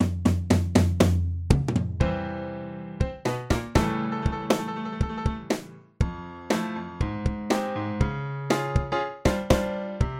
piano

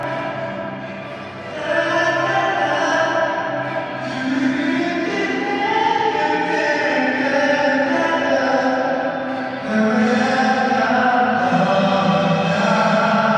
start epic large plate

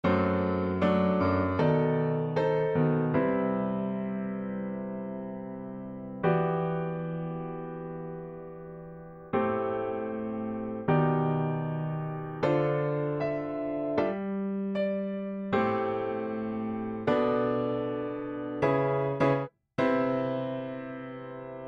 piano basic

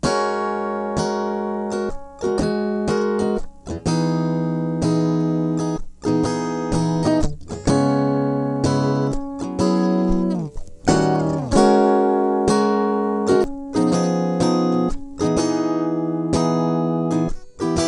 guitar

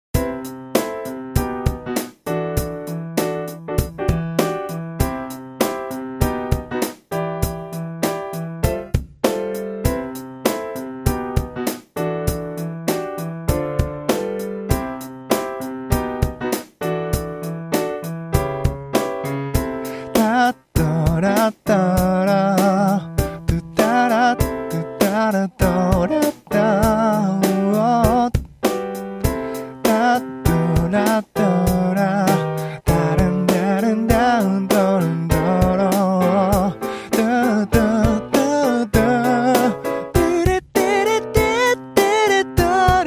song 8beat

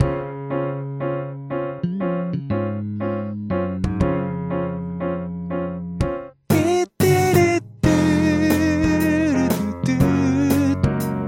last bass

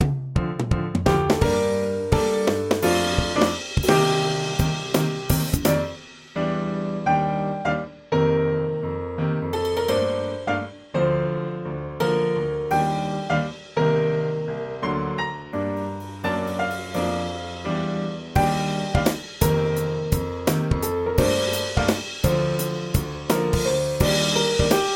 start drum

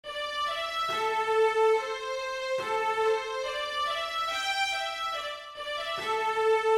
strings

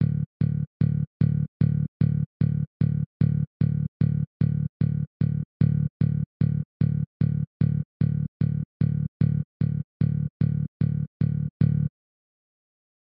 bass

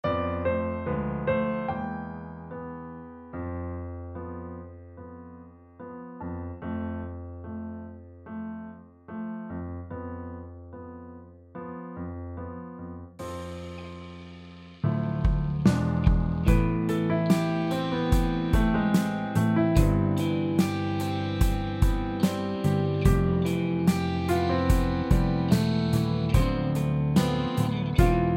ballade melo

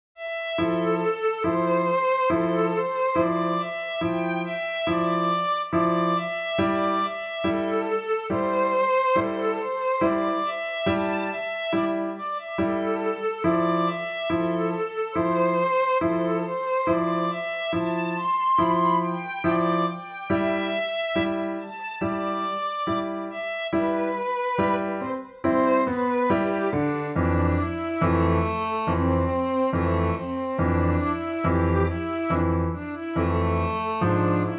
violin solo

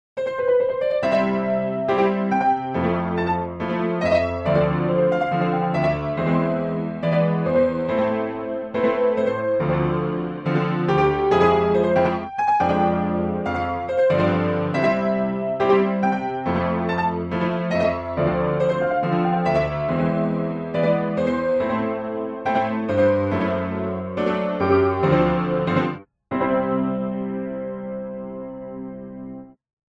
delay

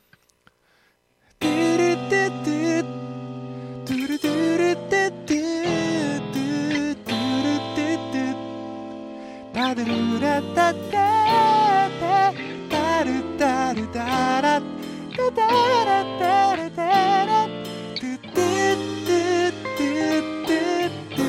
start guitar

